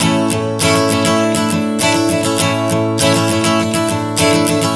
Strum 100 A, One Chord Strumming 100 bpm

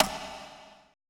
CONGA SLP L; BPC DRUMS

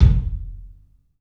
KIK, LIVE CLUB KK, Drums; 3D Sound

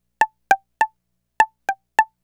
String

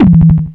Dunk, Drums, Industrial

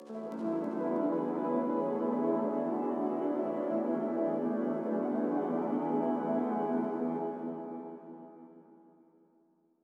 five piano pad

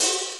HAT OP; MPC-60 DRUM2; Greytsounds VOL-1

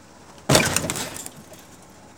TRUCK JUN, Transportation, Truck Junk

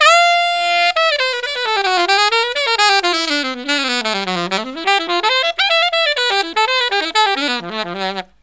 SOLO SAX; USB Soundscan vol.29 - Killer Brass Riffs; AKAI

